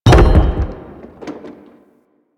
Debris Metal Wood; Warframe; Ambience; Entrati

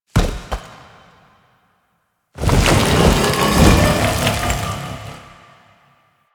Idle Noble Unarmed; Warframe; Warframes; Idles

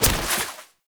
Fishing Net Destroy; Objects

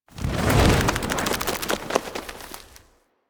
Infested K Drive Boost Slow, Vehicles

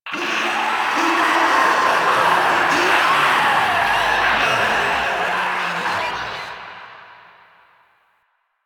Infested Swarm Stinger; Warframe; Quests; Entrati Labs

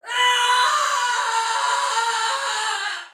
Death Fire Soldier Female, Battle; Dialog; Sinecure

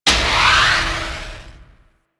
Glaive Throw Start; Enemies; Warframe; Infested; ZealoidPrelate

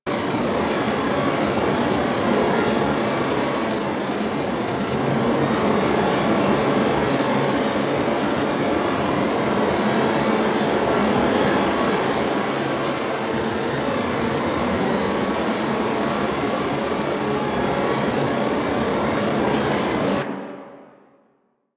Shades Loop; Enemies; Deco Shades Loop; Warframe; Warframes; Pax Duviricus

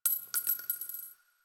Target Practice Shell Casing; Quincy; Warframe; Quests; Nineteen Ninety Nine

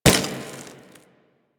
Glass Bullet Impact; Warframe; Ambience; Nineteen Ninety Nine

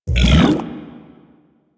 devour regurgitate; Warframe; Warframes; Grendel

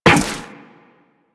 Step Zipline, Footsteps Walk; DE; Warframe; Tenno

